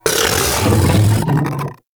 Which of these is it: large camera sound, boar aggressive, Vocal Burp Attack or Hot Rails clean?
Vocal Burp Attack